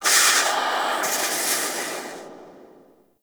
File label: Ship Machine Steam Blast; Warframe; Corpus Ship Remaster